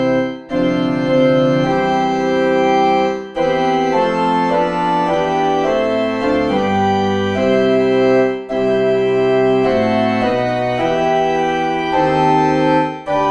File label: Organ